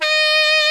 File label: TENOR SAX